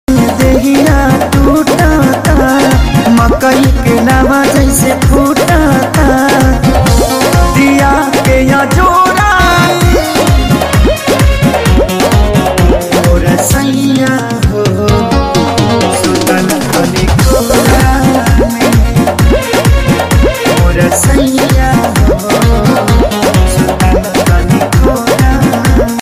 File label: Bhojpuri Song Ringtone